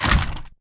Damaged Torso scrape Concrete; AI Stalker; Titanfall 2

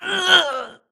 grunt death quick; Titanfall 2

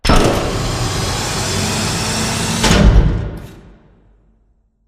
Assembly Floor Lift; Boomtown; Titanfall 2

